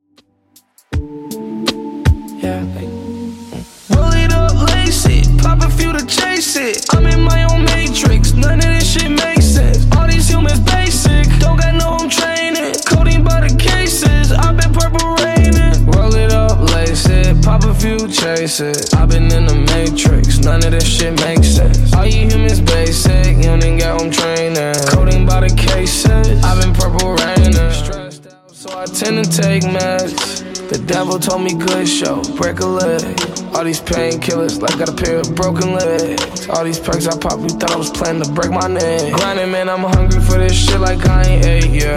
Top40 DJ Friendly Redrum 80 bpm

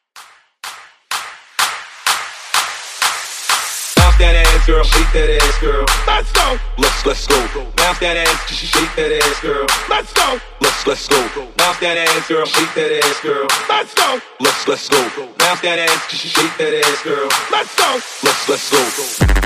Tech House

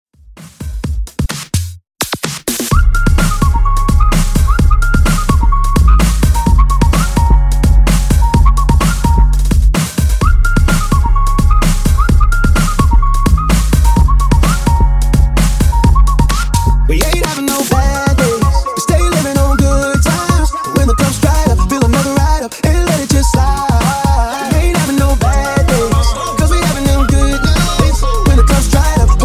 Funk Booty House 128Bpm

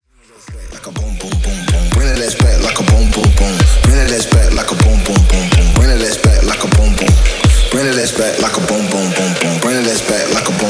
Techno Bass Dirty 125bpm; remixplanet

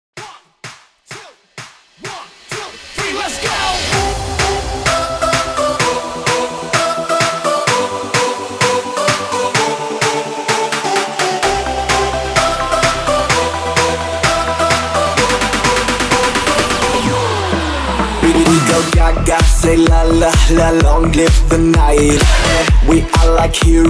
CLAPAPELLA STARTER 128 BPM; GENERATION PARTY 2021

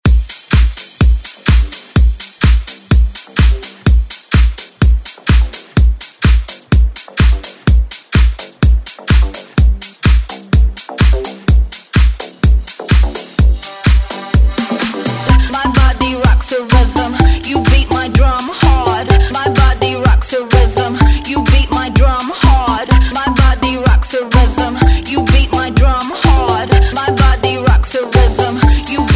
Dance Hype Redrum

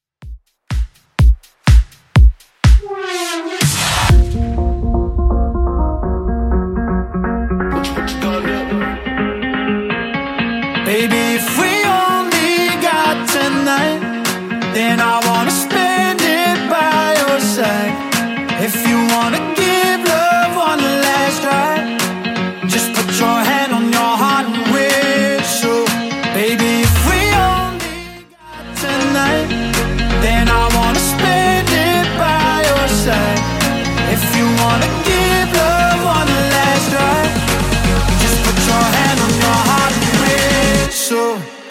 House Redrum 124bpm

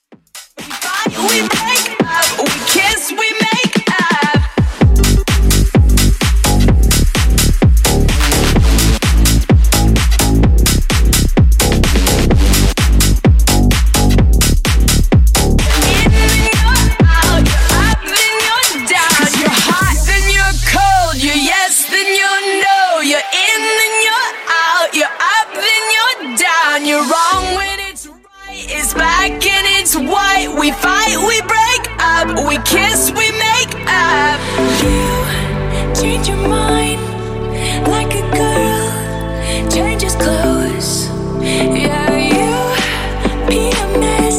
Top40 Epic Intro 128bpm

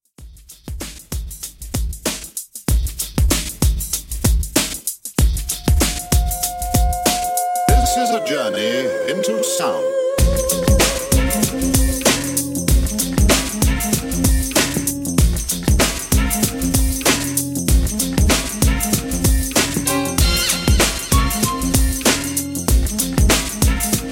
90s Redrum, 96 Bpm

